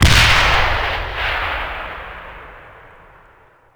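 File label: bazooka soldier fire; WAR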